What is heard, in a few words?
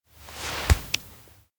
hold hand; rikka; anemoi